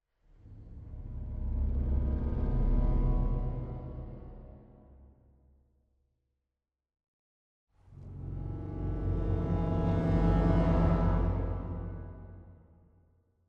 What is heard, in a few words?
Tension Block; The Last of Us